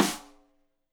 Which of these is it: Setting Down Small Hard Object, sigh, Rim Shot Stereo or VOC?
Rim Shot Stereo